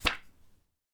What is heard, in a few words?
Page Turn